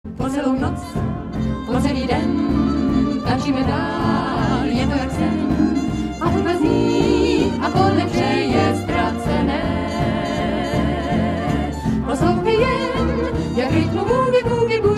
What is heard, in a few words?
Boogi woogie